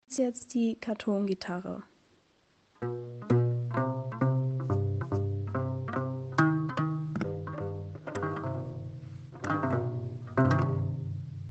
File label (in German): Kartongitarre